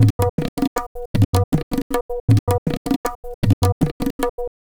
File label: tabla, 105 bpm; Zero-G; Pure Tabla